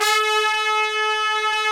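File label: trumpets swell; Roland L-CDX-03 Disk 2; BRS Tps Sec.1 f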